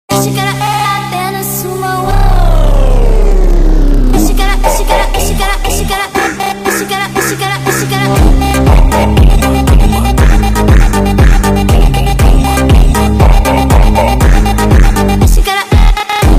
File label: funk